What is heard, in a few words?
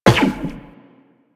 Run Zipline, Footsteps; Warframe; Tenno